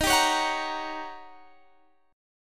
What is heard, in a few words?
D sharp 7th Sharp 9th, Guitar, Standard; strummed; D#7#9; JGuitar